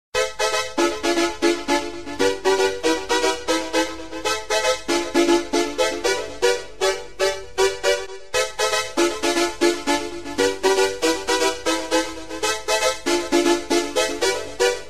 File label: Electro Beat Sample